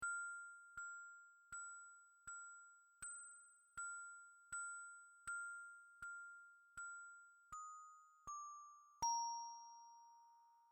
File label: instrumental